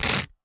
Toast, household rhythm samples hits

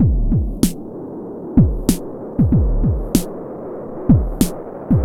33 DOOM BOOM 95.2 bpm, Loops Breaks; POTBreaks

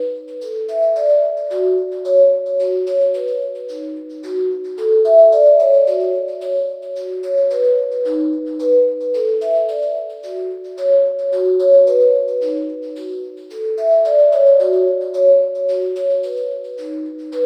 Guitar Based; Guitars; Eclectic Electronica

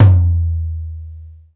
TOM LO, Roland; Club-50 - Foundations Roland; Naturals A

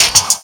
Spitter Hit, Esoterik Beatz; Spitter Kit

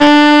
low saxoph; lemmings; Amiga Soundtracker Sample Packs

